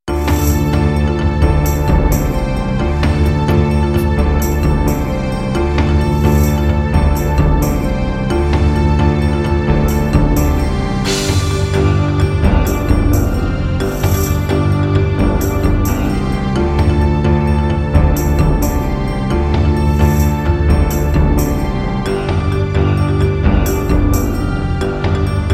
Roland SD-90 GM2MODE; Heretic

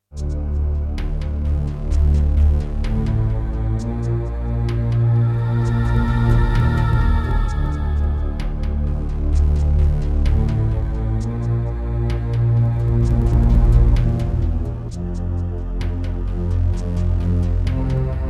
Yamaha DTXpress; Descent II

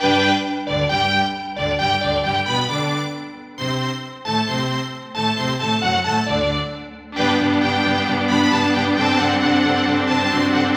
LG MIDI ART GS1000R; classical; Mozart; Eine Kleine Nachtmusik partial

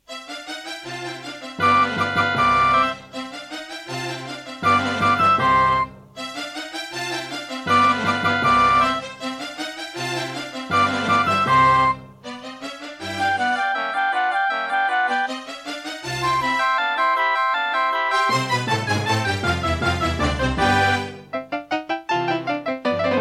Serdaco Dreamblaster X2GS; classical; Mozart; Concerto K467 partial

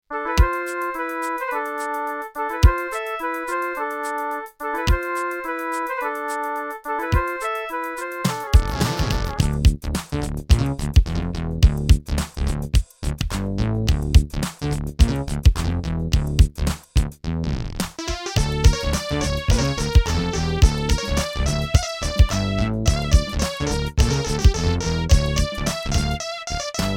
Creative Midi Blaster MB10; Lost Vikings

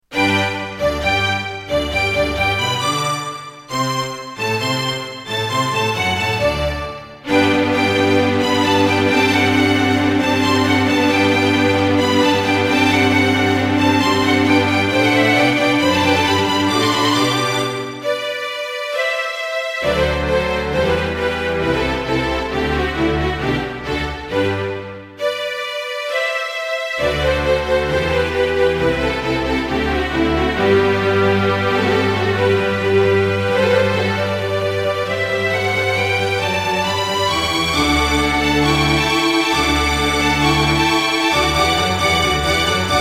Korg NS5R; classical; Mozart; Eine Kleine Nachtmusik partial

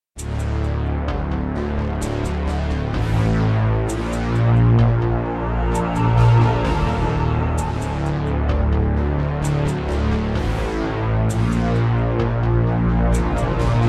Roland Edirol SD-20 GSMODE; Descent II

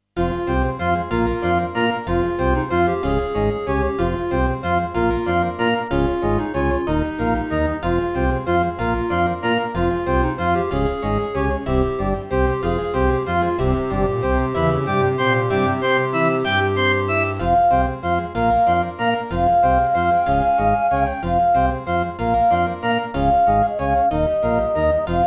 Serdaco McFly; Death Gate

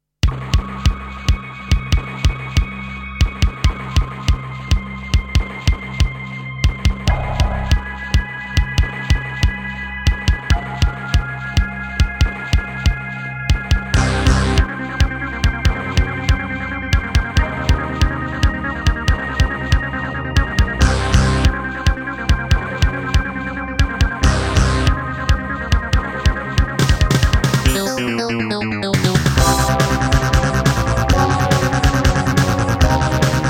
Creative Midi Blaster MB10; sigil doom episode 5

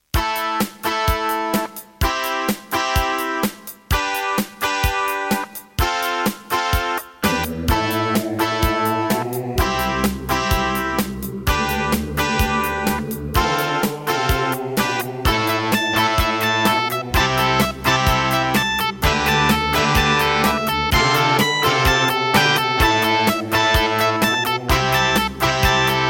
Roland SC-55; raptor call of the shadows

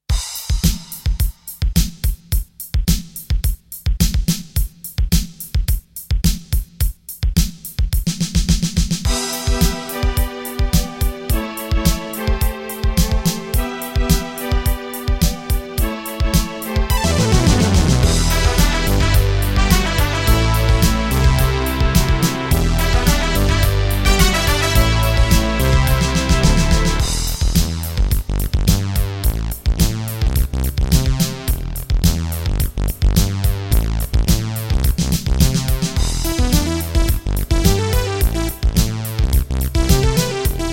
Midiplus MiniEngine USB

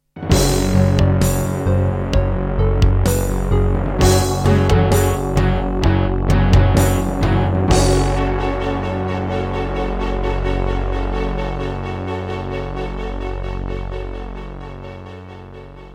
Creative Midi Blaster MB10; Doom (Sigil); Introduction

